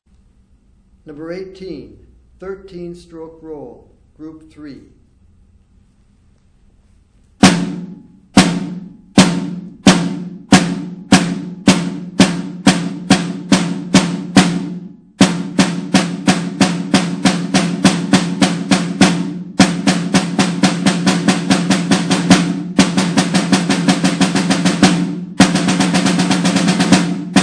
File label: snare rudiment